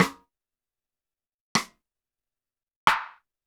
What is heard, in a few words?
Roland TD-20 Snares